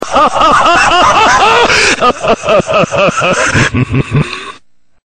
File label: laugh, reverse